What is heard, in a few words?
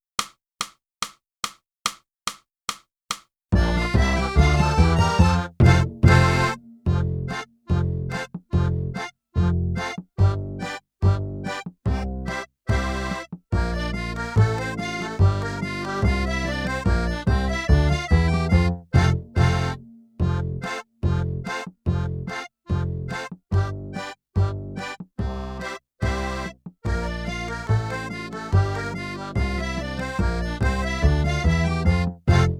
clarinet, the college hornpipe; (Morgan) - Boosey Woodwind Method: Clarinet (Book 2)